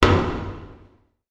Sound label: impact metal hit reverb; zapsplat